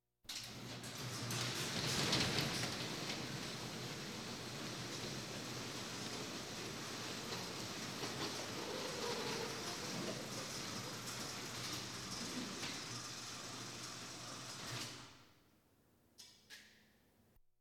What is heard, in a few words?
Police, Fire engines